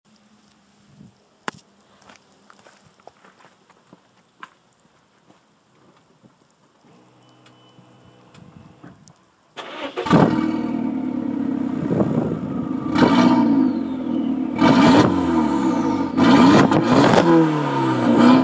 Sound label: Sound, jetta vento